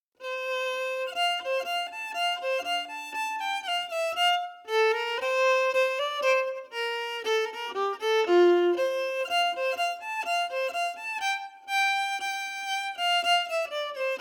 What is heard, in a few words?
slow; Hulls Victory